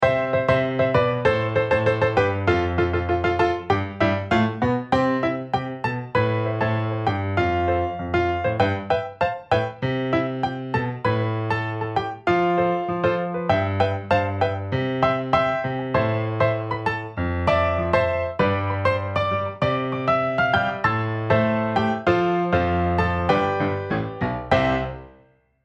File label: Piano Only